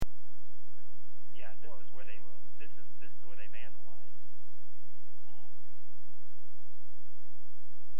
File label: breath